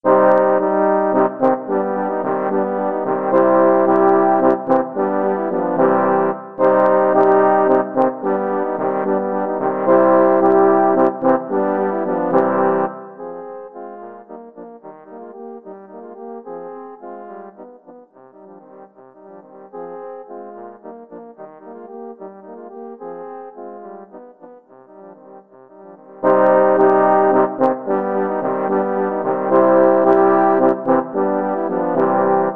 french horn quartet

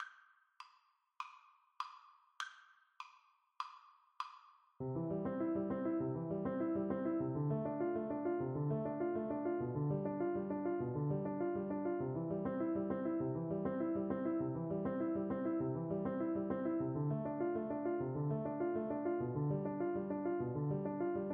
Piano Duet

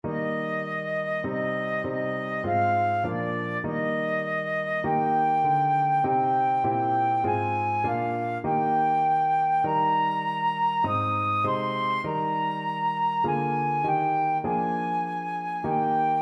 flute

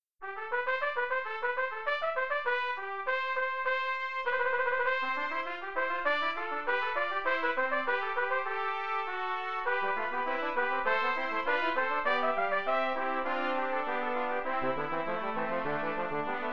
fughetta in c major, brass quartet

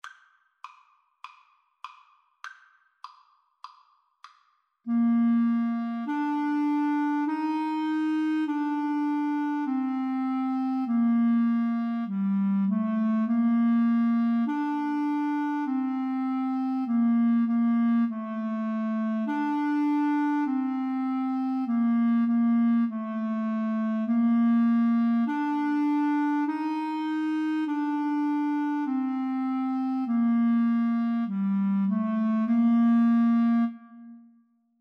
twinkle twinkle, Flute-Clarinet Duet

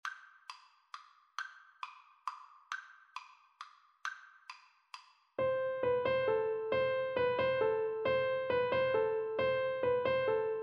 carol of the bells Recorder

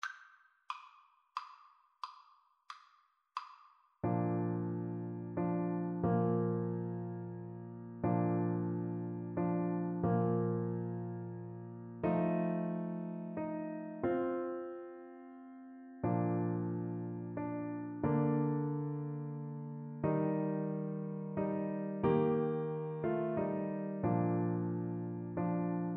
silent night alto, Saxophone

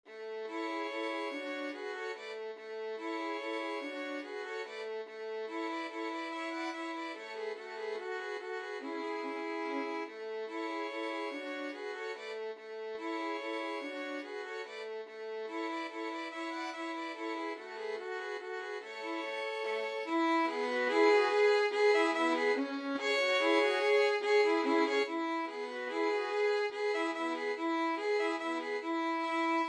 violin trio; lakme

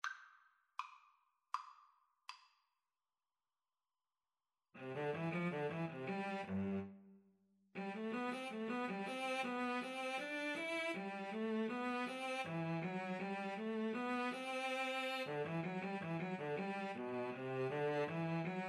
Violin Cello Duet; bach invention 1 bwv 772

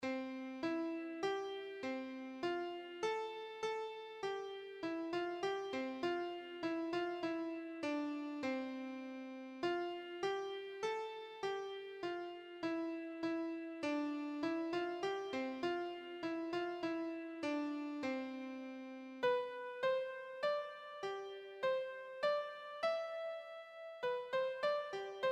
jesus christ is risen, keyboard

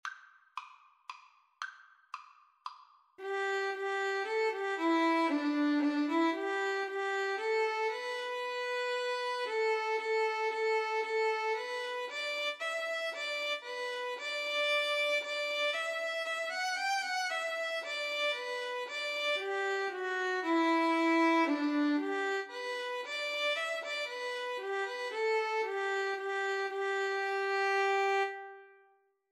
be thou my vision, Violin Cello Duet

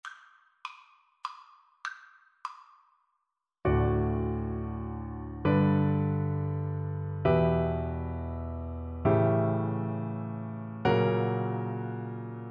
we wish, Double Bass

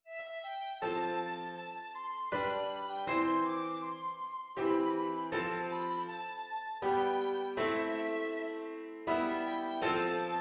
tin whistle

